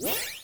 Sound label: MOVE; C64 Dreams; Multi-Sound Default